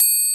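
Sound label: Open Triangle; EMU Planet-Phatt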